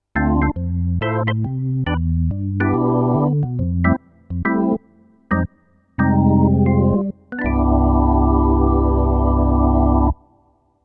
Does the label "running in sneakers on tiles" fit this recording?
No